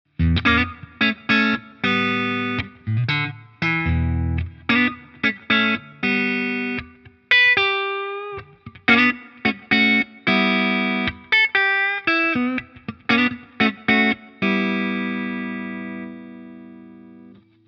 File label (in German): Reverend Trickshot Hals clean